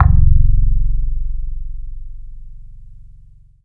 THUNDER C2; Greytsounds; Scott Peers Sound Engineering

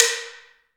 AMB SLIT, LOG DRUMS; Heart of Africa

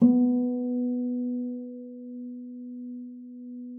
FLAG A#3, harp; Xsample VOL-8; HARP+FX

